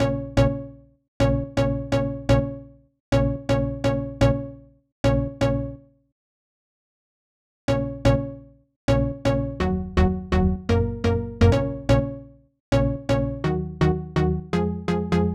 Melody 3, 125BPM; Vengeance Samples; Cutter Kit

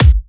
Hard Kicks; Vengeance Samples

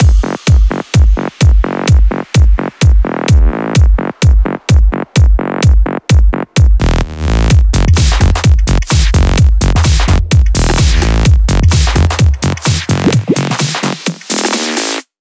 128BPM Mix; Vengeance Samples; Glorious Kit

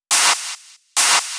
Clap, 140 BPM; Vengeance Samples; VTDS2 Song Kits; Female Take Me Away

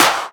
Claps; Vengeance Samples

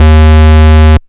Root D#, Bass

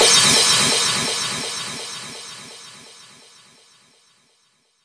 Crash, Cymbals; Vengeance Samples; VEH3 Cymbals

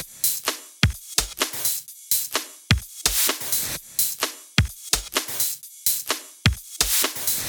128BPM Dogde Kit

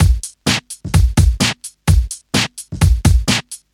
House Beat, 128 BPM

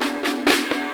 Fill Ins 128BPM; Vengeance Samples; VEH2 Loops

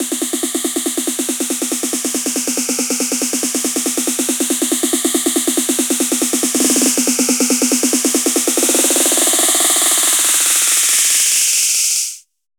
140BPM SNARE 8 Bars; Quantum; Vengeance Samples; VUF1 Snares&FX Pairs 140BPM

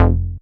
Synth Bass; Vengeance Samples; VEE Synth Shots

